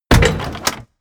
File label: mechanical lever metal medium down